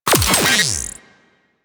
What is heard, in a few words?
Charge Gun Empty; Crp Charge Gun